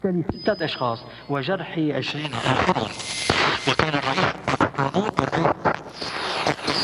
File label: Radio Tune Wrong, Short Wave Recordings; Sonic Foundry; The Electronic Music Manuscript Collection; EVP Short Wave Recordings